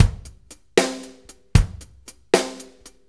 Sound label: 6-8 Loop, Full Kit Loops; Drum Tools